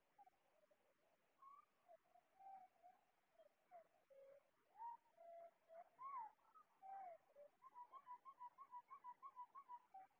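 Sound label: Winter Wren A speed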